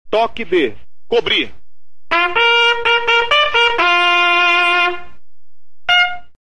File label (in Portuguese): Cobrir, toques de corneta